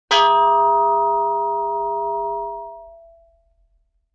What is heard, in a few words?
Brake Drum Hit Low; Vintage Cartoon; Drums & Percussion